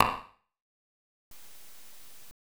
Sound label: Reverb; Olcso Draga